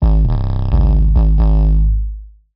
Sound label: xtreme deep bass